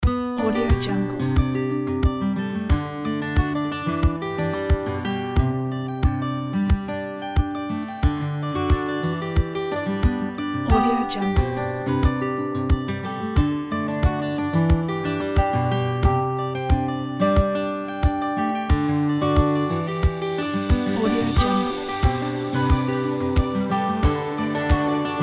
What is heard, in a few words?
positive acoustic